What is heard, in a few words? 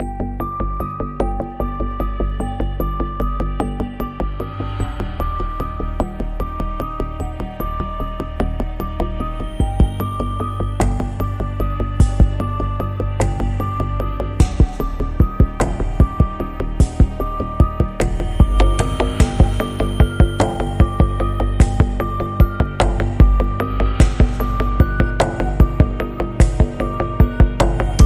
Mysterious Pulse